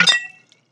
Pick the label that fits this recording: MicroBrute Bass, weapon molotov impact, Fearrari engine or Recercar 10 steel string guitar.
weapon molotov impact